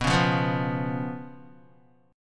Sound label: B Suspended 2nd Flat 5th B down Guitar; strummed; JGuitar